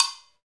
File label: MINIC, Roland; Club-50 - Foundations Roland; CowBel+Metl